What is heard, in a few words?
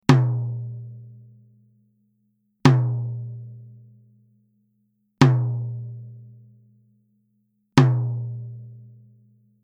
TOM Power Center Hard